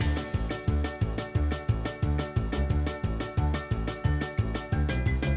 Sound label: Ambience Sound Free Spin; fruitshopchristmas